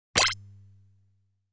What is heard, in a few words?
Bullets Fired in 8 Directions